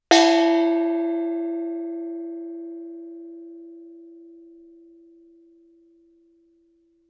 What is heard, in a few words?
thai gong ff E4; MIS Pitches - 2014; Percussion